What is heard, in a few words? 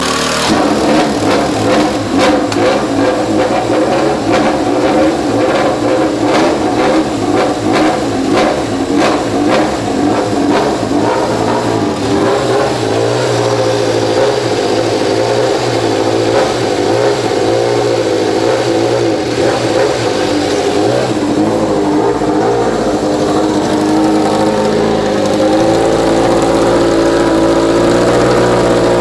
Bike Starting